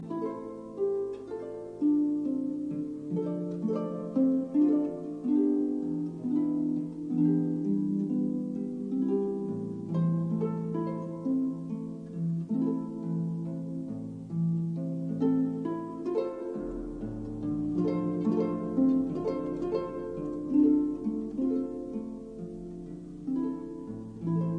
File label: version for harp piano and string quartet Moderately slow; Ennanga; Louis Kaufman